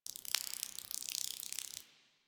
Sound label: Insect Flyby; Ambience; One Offs; Insects; Warframe; Quests; The New War; Drifter Camp